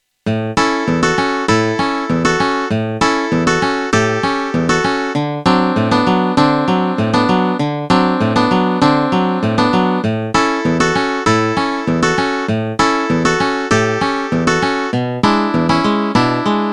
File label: Rockabilly Rhythm